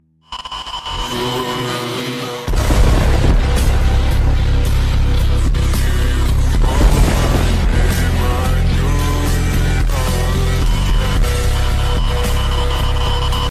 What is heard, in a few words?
lightning